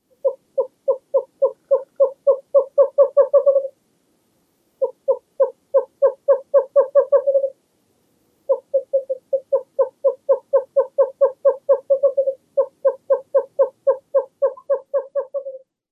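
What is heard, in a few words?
song, Western Cowbird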